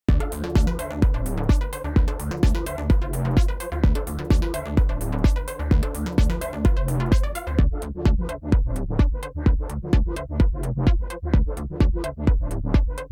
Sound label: Trance Loop